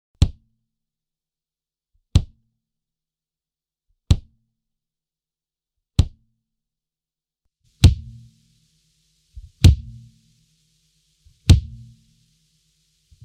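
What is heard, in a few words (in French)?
kick, grosse-caisse; batterie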